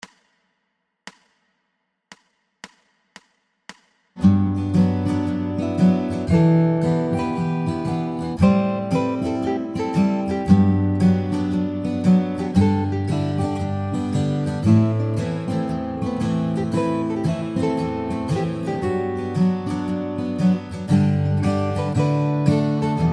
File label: guitar